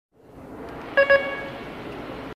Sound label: fake bus card